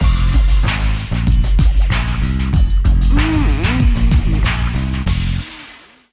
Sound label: start; Amatic; Casanova